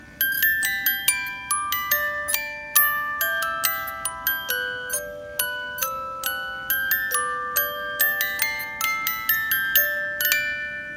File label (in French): boite a musique; chihiro